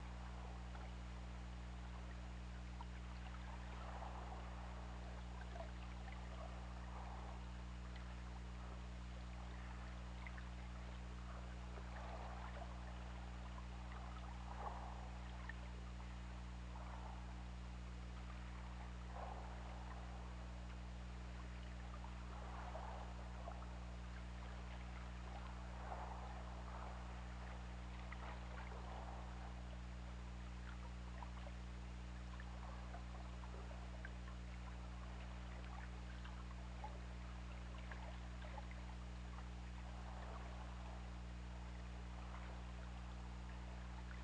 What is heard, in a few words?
Orca; OrcaLab